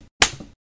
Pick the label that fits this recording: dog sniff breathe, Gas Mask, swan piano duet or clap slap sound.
clap slap sound